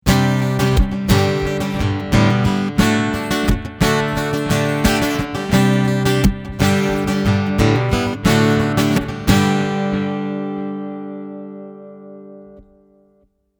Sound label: Trace Elliott Delay